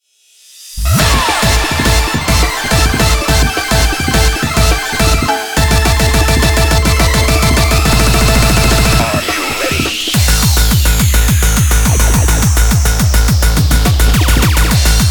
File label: inst bpm210; taikonotatsujin 2023